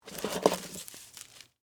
Rope Pick Up, From, Wood, Surface, Heavy, Rope; BlastWave

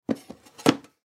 Film Reel Case Open; Film Set; Technology; Blastwave

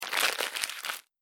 Crunching Plastic Wrapper Noise; Crinkling Sound Effects; Foley

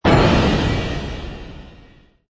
Space Hit, Phaser crash, shock or space impact; Sound Effects:Sound Design

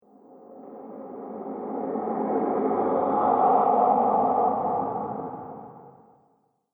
Gruesome Ghost Monster Discharge; Sinister, Scary, Spooky Or Just Silly; Ghost Sound Effects

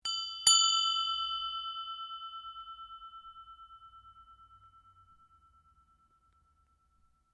Bell Tibetan Ring Soft, Tibetan brass bells; Musical; SoundEffects-Foundation1

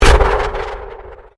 Convolution Pulse

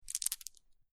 Marbles Handling Foley; Marbles or small pebbles moving in hand.; LYN099 package of Various Impacts; Miscellaneous Toy Sound Effects

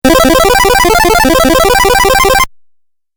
8 Bit Style Arpeggio; Video Game Sound Effects

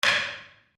Wood On Wood; Material components for constructing more complex sounds; Wood (Sound Effects:Materials); SoundEffects-Foundation4

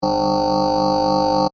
Scanner Beam Ray, Scifi; Science, Fiction; Tech; BlastWave

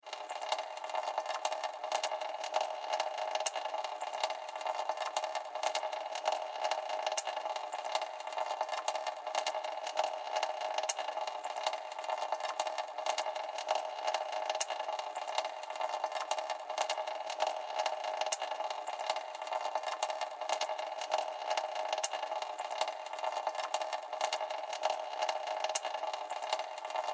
Water Sink Leaky Faucet Drip Loop, bathroom; Household; SFX Bible 6

